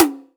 STD. TOM, DRY KIT; AKAI S6000 CD-ROM - Volume 1; DRUM RYTHM

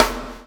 CHAIN; Spectrasonics - Backbeat

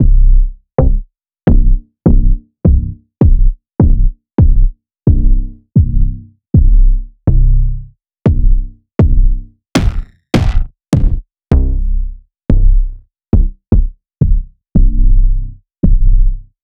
Kick Layered

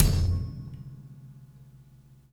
Combo Shard, Processed Drumkits

